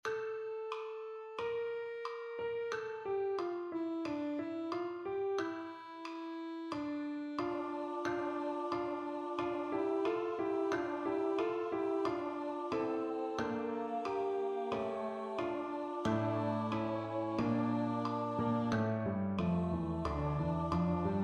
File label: Chor Sop; Chor Soprano; Durante